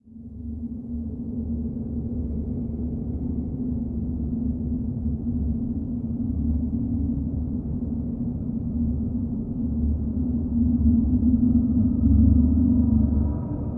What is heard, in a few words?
Suspension Ambient Breath; gamerip; Shadowgrounds